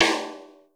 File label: LATIN S; Crypton - DrumBase5000; R70 KIT #2